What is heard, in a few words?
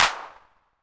Clap 808 Color, Hand Clap